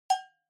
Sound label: Cowbell High